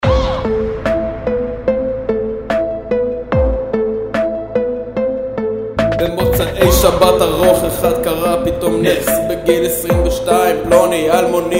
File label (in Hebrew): גינגל; שייכים ישיבת שייכות התורה